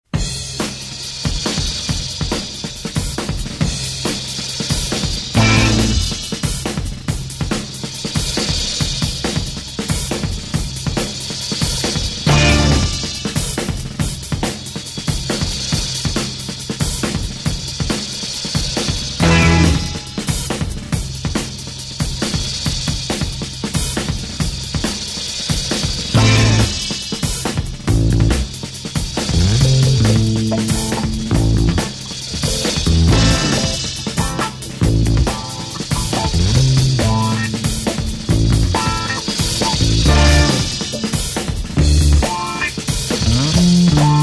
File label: news background